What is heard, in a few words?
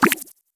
Double Jump; gamerip